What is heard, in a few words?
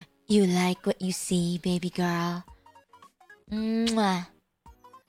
bleghh